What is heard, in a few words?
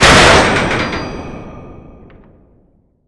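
metal pipe snap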